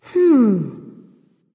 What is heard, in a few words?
thinking; smite; voicepack; nike